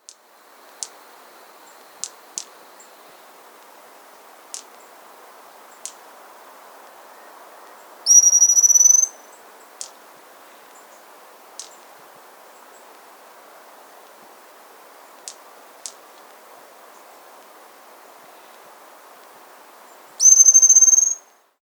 Hazel-Fronted Pygmy-Tyrant (Pseudotr; S. America

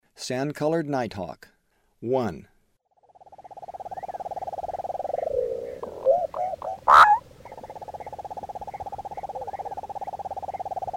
Sand-colored Nightjar; S. America; Eastern Lowlands

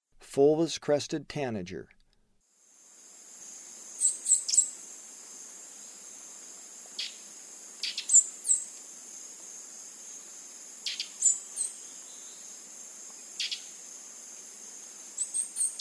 Fulvous-crested Tanager; S. America; Eastern Lowlands

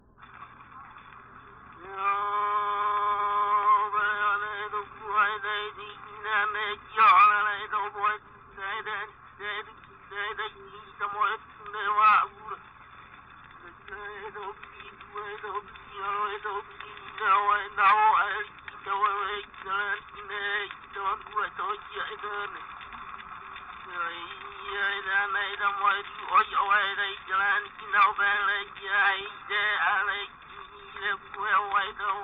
Prayer chant to the goddess Pele, Hawaiian; World Music; Na Leo Hawai'i Kahiko - Voices of Old Hawai'i

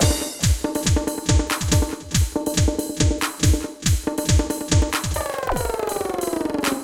techno 140

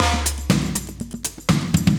120, loops; Sound Effect Library

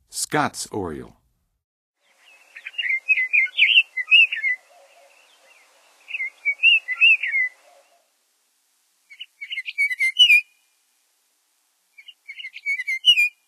Scotts Oriole, Songs of Birds of North America; Western USA; Western USA Songbirds

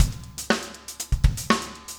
funk, 120bpm, loops

